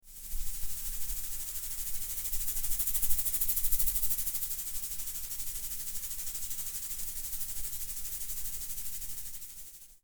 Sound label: Sprinkler